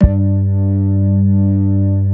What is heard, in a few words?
Somber Organ; Mo' Phatt; Digital Sound Factory Mo' Phatt